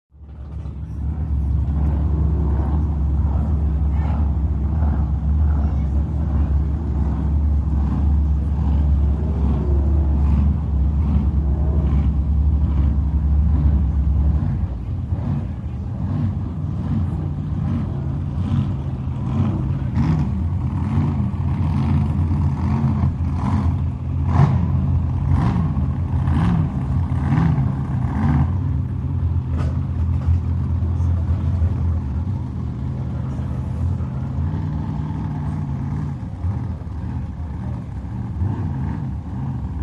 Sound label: Drag Boat Start Ambi